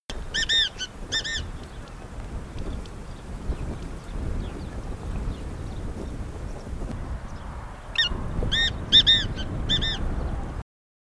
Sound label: Black Francolin Sound Francolinus francolinus